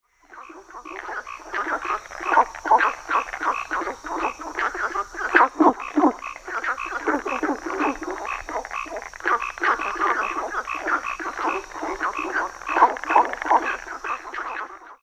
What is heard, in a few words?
frog chorus, wetland